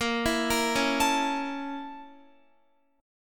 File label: Bb Minor Major 7th Flat 5th, Guitar; x,13,14,15,13,16; arpeggiated; 4E,4A,5D,5G,5C,6F; chord shape